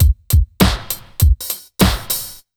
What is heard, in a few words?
100 BEAT, Pure Electro; USB Soundscan vol.03; AKAI